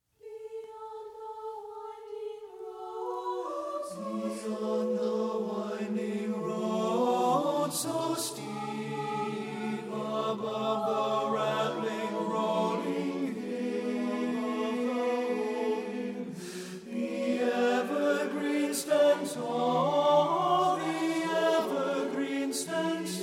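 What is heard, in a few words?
tenor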